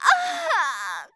dead, monster; bkboss

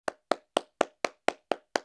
clap; warrior; action

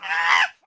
fall; monkey

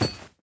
drop, immotal ghost; monster

